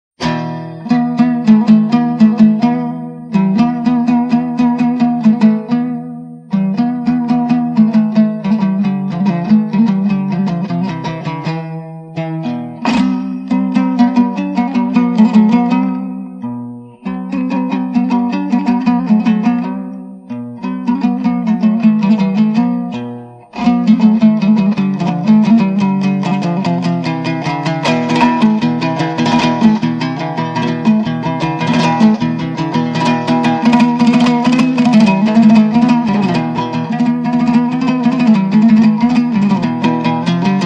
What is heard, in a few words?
turkmen kuii kui; dauletkerei